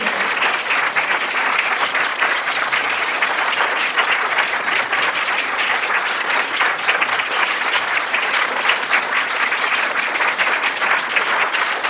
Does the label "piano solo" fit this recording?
No